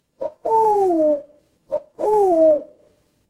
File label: animal owl